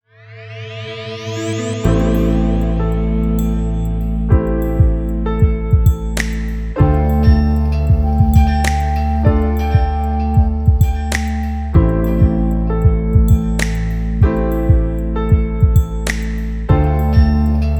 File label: (INSTRUMENTAL)